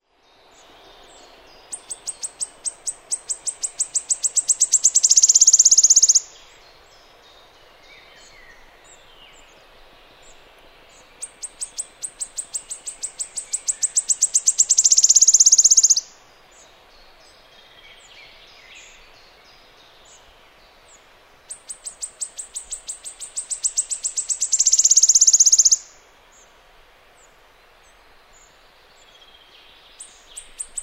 wood warbler

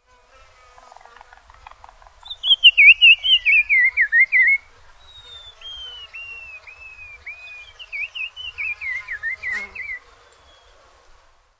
black crowned tchagra

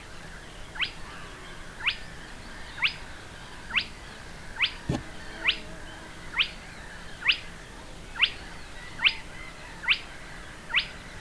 Spotted Crake; Parikkala

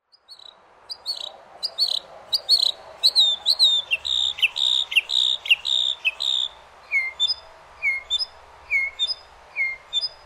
northern mockingbird

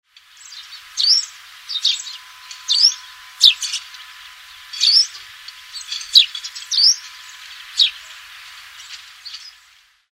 spanish sparrow

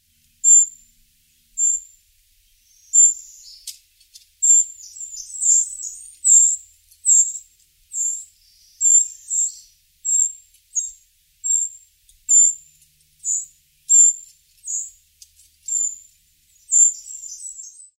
winter wren

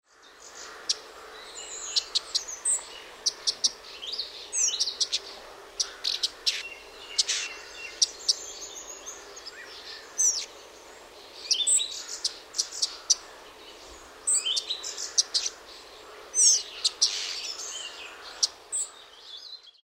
spotted flycatcher

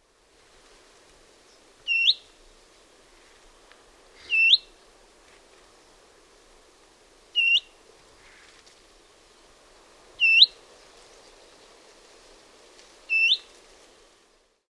willow warbler

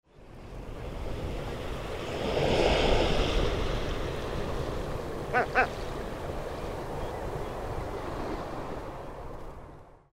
great black backed gull

